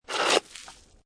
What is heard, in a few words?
Snow And Ice Ice Scrape With Ice Fragments